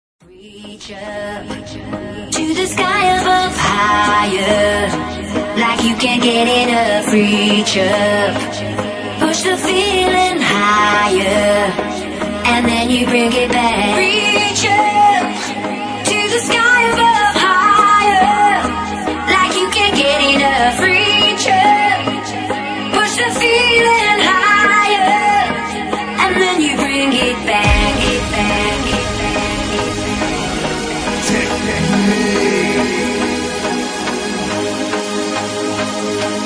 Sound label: dubstep mix